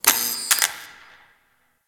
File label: Light Flicker; Ambience; Props; Warframe; Orokin Derelict